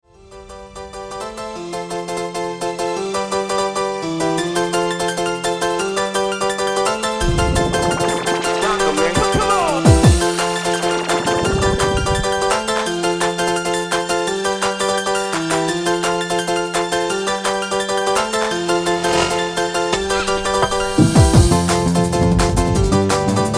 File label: Trance Breakbeat